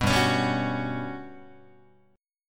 G Major Sharp 11th G down Guitar 4A 4E 5A 5D 5G sharp 6C sharp; strummed; JGuitar